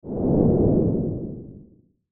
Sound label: Sci Fi Door Shield Force Field Shut Down; Stinger; Blastwave